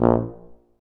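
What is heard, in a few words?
brass C tuba, staccato; Solo Brass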